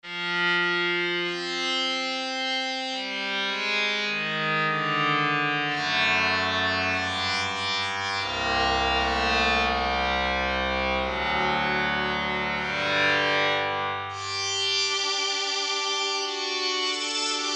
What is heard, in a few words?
Cello, Korg MSC-14S Sound Effects